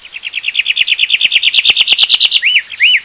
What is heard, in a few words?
Natural Birds Voice